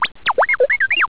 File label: r2d2 talk